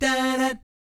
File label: DAH DAT, Jazz